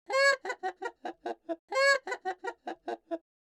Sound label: goose laugh; emerald-crossing